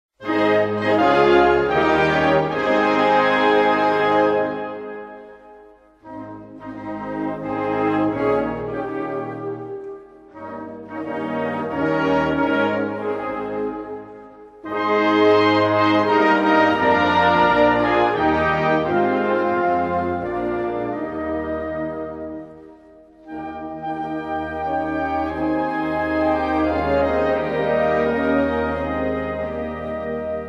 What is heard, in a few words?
National Anthem of Switzerland